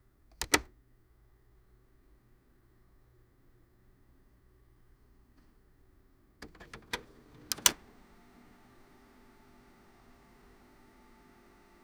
the sound of turning on, home appliances

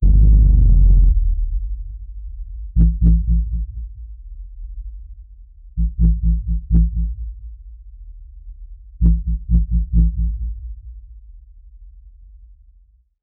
woolly mammoth sound slow, mammal

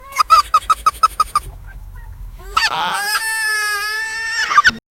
soft goat bleat and playf; domestic animal

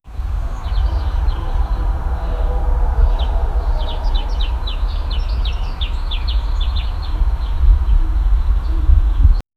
a cheerful meow and the; happy

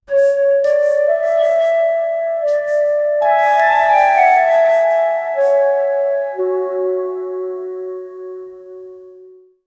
soft and cheerful instrum, morning

